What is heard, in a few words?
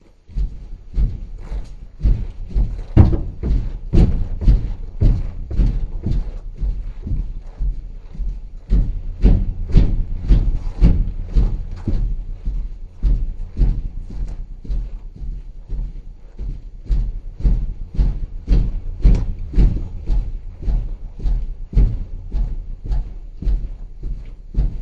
Walking Above